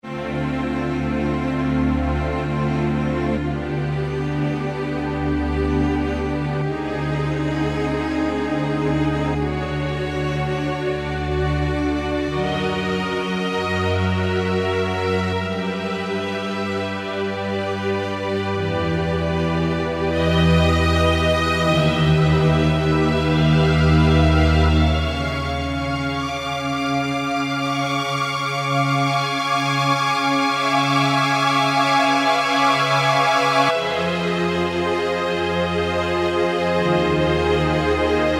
Strings, Roland JD-990; Factory Patches

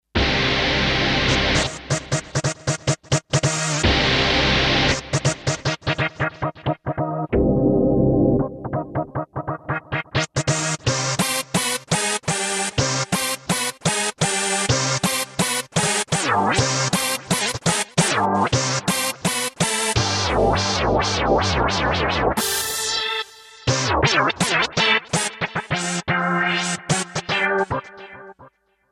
Poly Morf, Kawai K5000S factory presets

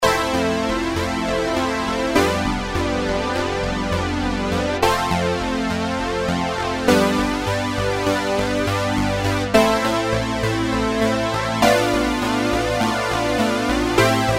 PolySynth, Roland MKS-50; Factory examples

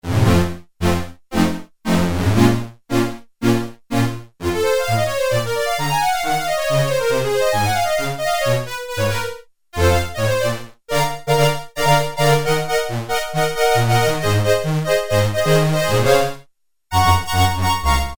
Accordion, Korg Poly-61M

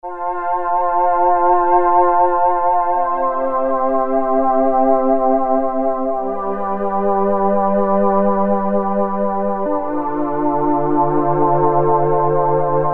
CHOIR VOICES, Roland Jupiter-8, Factory patches

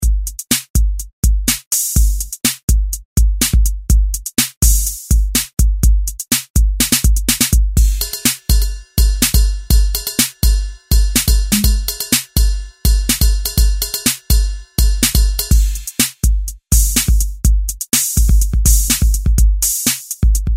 Electro Kit; Sonic Reality Modern Songwriter

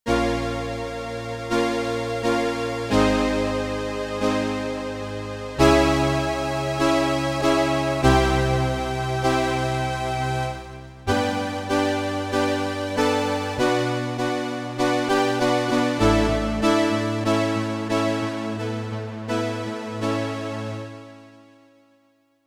Prophet 5; Yamaha TX16W Sound Library; Another Two

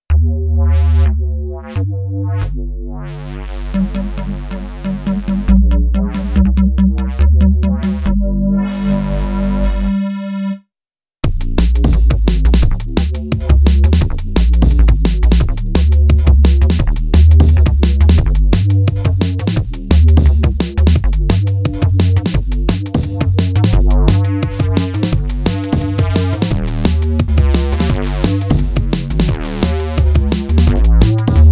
D' n' B Sub, Korg Radias-R

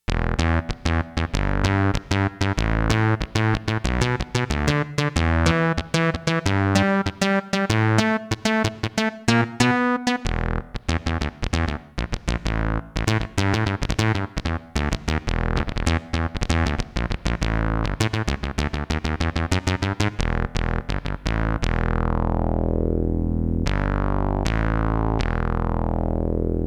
SYN BASS, Roland JX-1; Factory presets

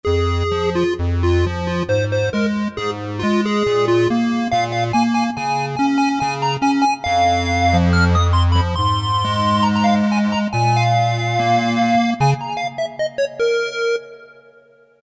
Square Wave, Roland MT-32

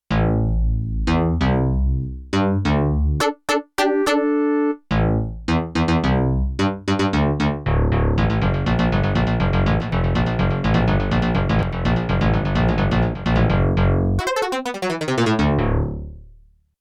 Rock & Roll; Rock Performance 2

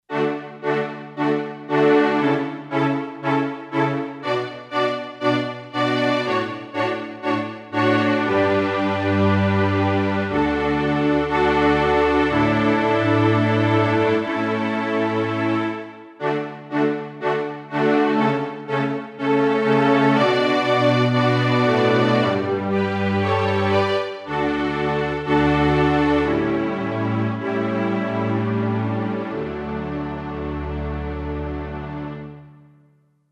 CHO STRINGS, Roland SC-880; Factory Patches

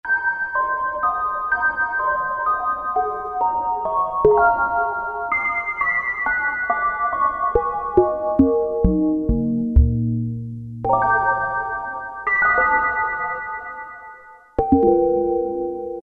Clock Chimes, Roland Juno-106; Factory Preset

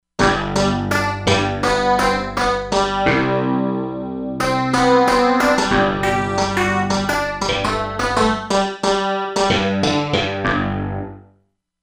Rude Pulse, Korg MSC-07S Synth; Korg MSC-07S Synth 2

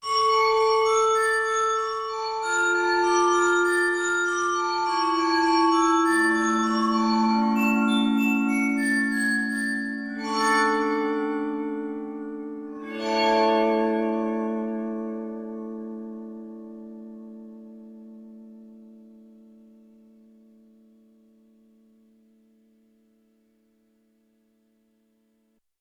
Handbell Long, Yamaha TX16W; Wind Chime & Handbell

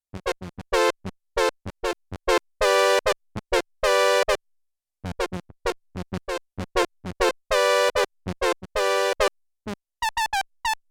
Single Prophet Brass, Prophet 5; Yamaha TX16W

